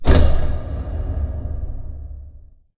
sliding door lg verb open stop; beacon; Titanfall 2

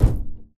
Footstep Jog Far; Titanfall 2

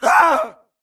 grunt death crush; Titanfall 2

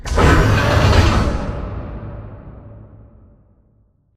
Robot Arm Pass Prep Close; Boomtown; Titanfall 2

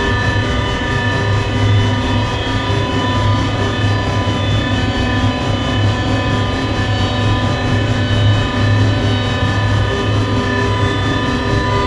Robot Arm Track Fast Close; Boomtown; Titanfall 2

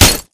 bullet light ballistic solid glass close; Titanfall 2

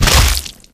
gore; Titanfall 2

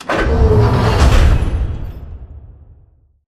Robot Arm Left Deliver Close; Boomtown; Titanfall 2

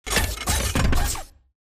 combat run turn; Titanfall 2

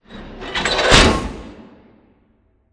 Car Rotate Stop; Titanfall 2; Boomtown

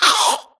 grunt death neck; Titanfall 2

